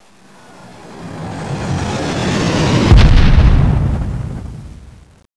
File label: incom boom; quake2; coop; slaughter